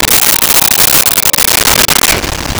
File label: LoFi Synthetic Lion Roar; Vintage Cartoon; Comedy Vocals; SONY PICTURES SOUND EFFECTS SERIES